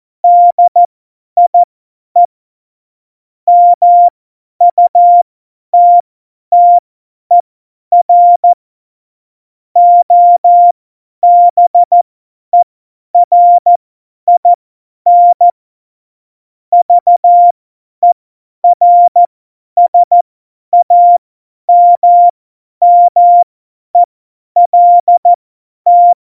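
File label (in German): Witz, cw morsen; Morsekurs; Witze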